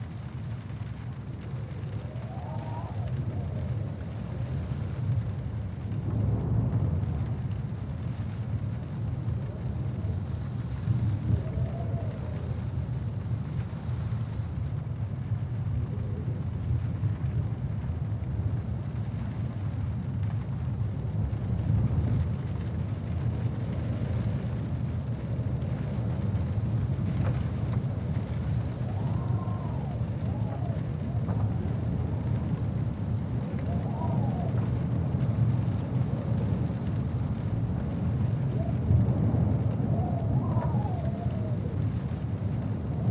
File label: Storm Closed Window Loop; Devour; Storm Lv1